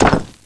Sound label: Hit Crumble; Death Blade; Creatures